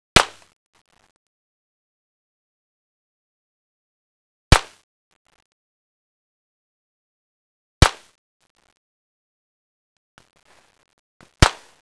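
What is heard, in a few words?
GUNFIRE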